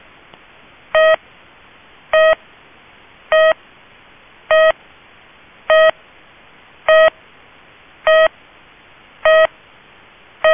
pip marker

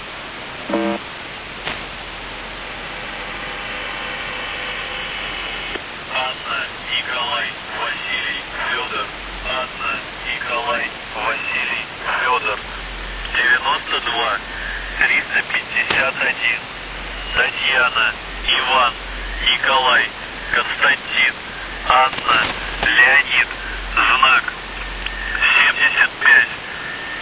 buzzer usb msg

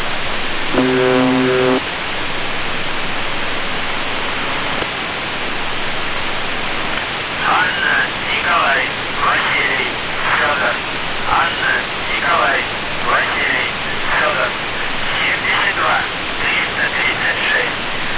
buzzer usb msg

